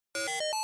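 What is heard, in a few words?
Device Activate Button, computers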